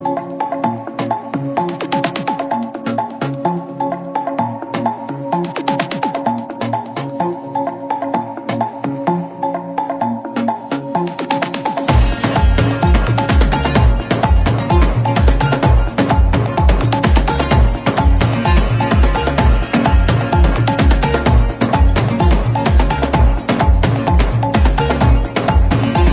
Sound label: new brazilian music ringtone